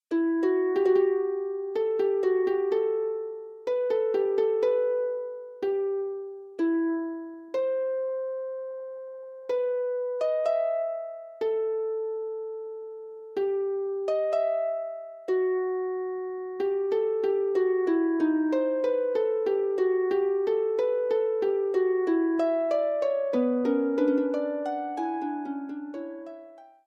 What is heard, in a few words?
Fugue, harp; Forty Eight Preludes and Fugues Book 2; prelude and fugue no 10 in e minor book 2